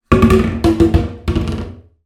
foley tub plastic drop into bathtub

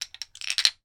toy bricks wooden movement; sound effects one

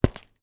footstep single high heel; foley; zapsplat

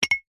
household ceramic ramekins x2 pull apart seperate

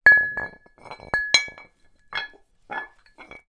sport cast iron weights place on dumbell bar

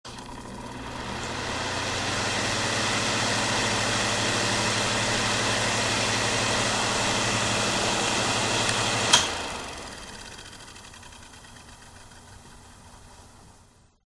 ventilator; fox audio

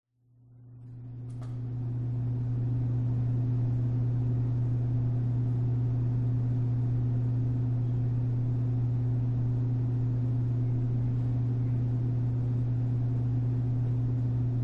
school hallway roomtone vending machine hum quiet

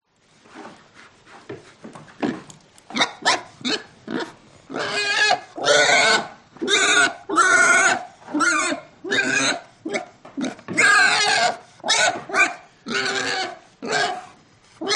Pig; audio hero

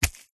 wet cloth dab squelch hard single; foley; zapsplat

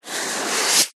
office sponge brush single stroke on paper long; zapsplat

